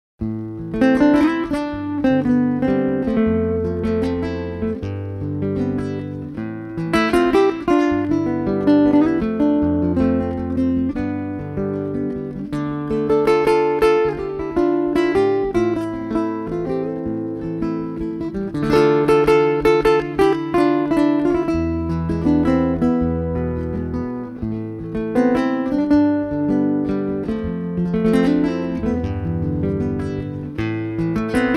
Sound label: Looper In Am